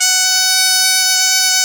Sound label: BOMB2 G3, BOMBARDE2, Celtic Flavours; USB Soundscan vol.42; AKAI